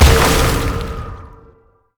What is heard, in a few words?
launch; LoL; syndra